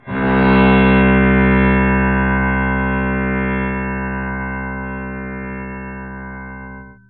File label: piano